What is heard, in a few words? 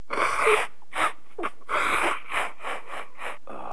people